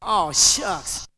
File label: Vocal; ZERO G - Datafile DISC 2